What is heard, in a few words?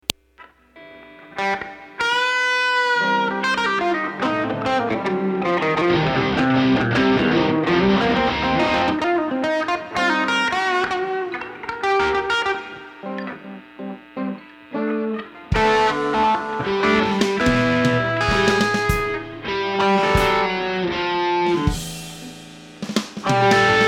Ambient Guitar Mix